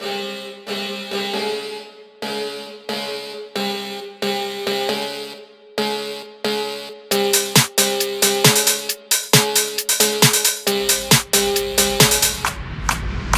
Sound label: nightcore phonk